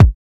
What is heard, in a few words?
old school verby bass drum A key, kick sample tuned to the A note; kick drum samples